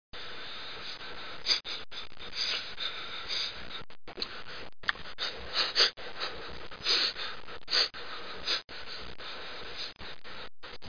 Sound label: BREATHS